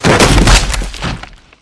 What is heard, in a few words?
Character Body Impacts